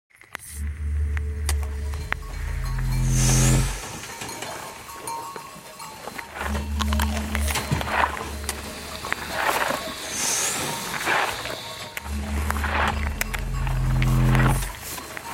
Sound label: interlude; chop on dispose